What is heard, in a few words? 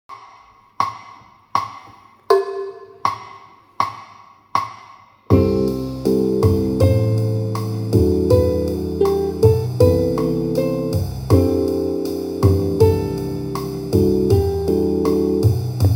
ukelele